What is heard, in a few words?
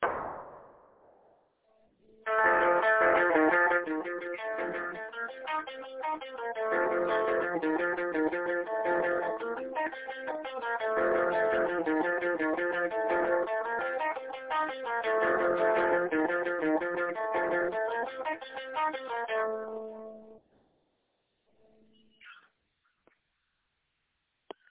jig like rock